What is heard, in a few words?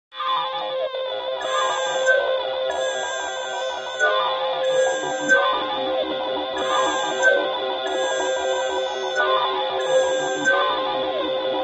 Instru Rythmik